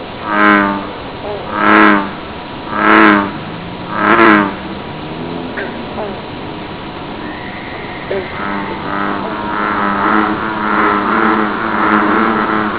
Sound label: bullfrog